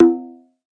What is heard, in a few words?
conga Open; ETHNIC and WORLD PERCUSSION